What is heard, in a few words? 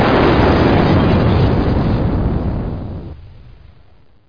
expl